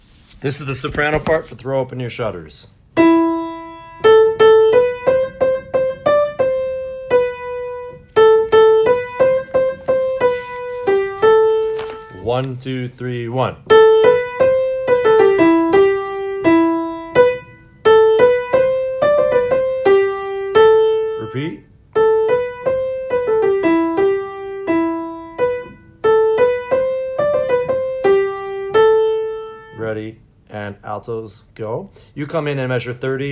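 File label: soprano, choir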